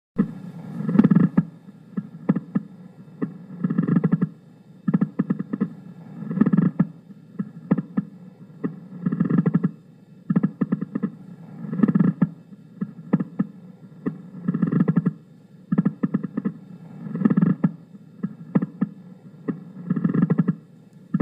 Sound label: Pleural friction rig; simulatorvzorky